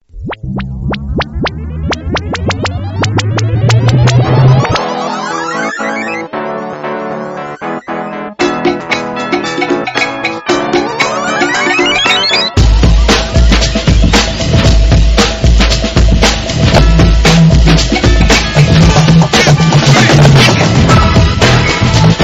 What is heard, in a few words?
ringtones; series tv; futurama